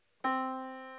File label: Yamaha Disk, piano sound